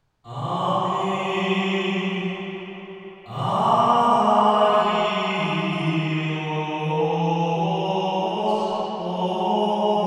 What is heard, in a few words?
Stage Processed; CCRMA